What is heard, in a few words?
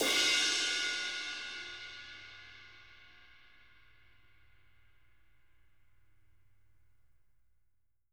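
dark crash, Living Drums, JZ CRASHES; Sampleheads; Peter Erskine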